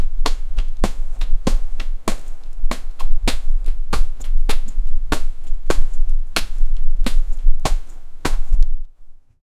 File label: jogging